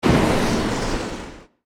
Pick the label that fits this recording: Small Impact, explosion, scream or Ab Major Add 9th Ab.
explosion